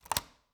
Cabin, front door, deadbolt, lock, latch, thick, open, loose, hyper; Eiravaein Works; Vaeyan IV